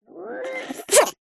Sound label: cartoon character cute high pitched sneeze